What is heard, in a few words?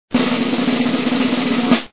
drum roll; Together in Jesus - First Reconciliation - Computer Fun; word snatcher